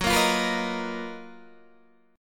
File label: F sharp Major 13th Guitar DADGAD